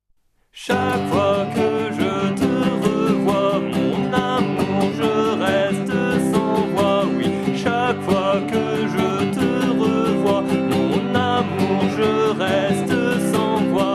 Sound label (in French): sans voix